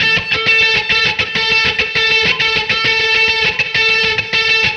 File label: lead, 100